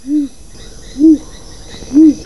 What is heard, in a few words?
Owl Mono